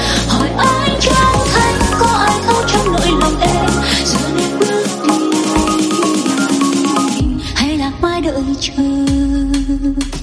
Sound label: Remix; nhac chuong